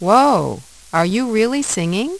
Singing; Opcode Systems

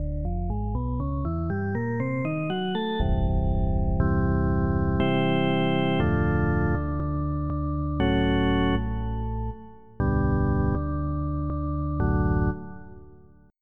Hammond Organ1; FF7

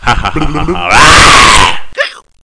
Tuba; GEOGRAFIA

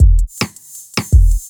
DRUMLOOP D+B 160; Drum+Bass

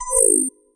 take inventory; Earth and Beyond; EA Games

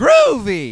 worm load; EWJDEMO

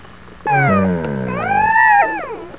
groan, wolf; wolfpark

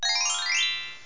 rocket b; Monster Media Number 15

